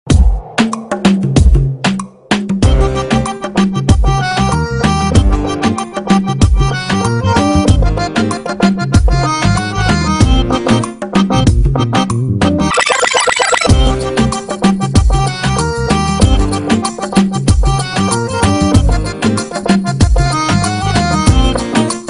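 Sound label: background music Telugu Ringtone; Julayi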